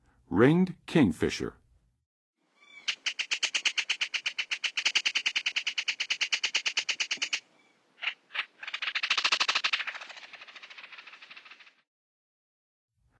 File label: Ringed Kingfisher; N. America; Songs of Birds of North America; Western USA Songbirds